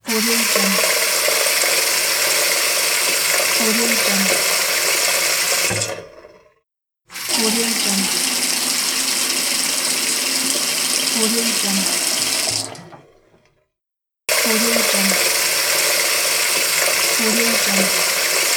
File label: water in the sink; soundcreator